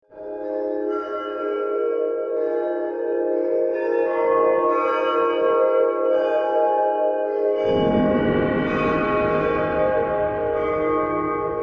Drone Texture Musical Tonal Dreamy Chimes Bells Gongs; Musical Sound Design